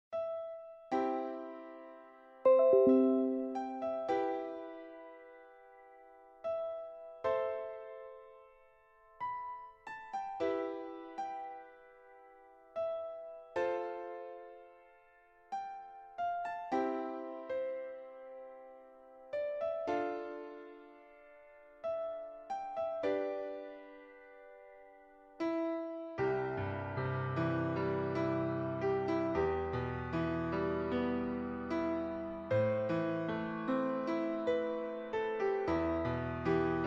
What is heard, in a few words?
fortepiano